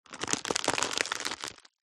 impacts card box contaning fragile goods squash break crack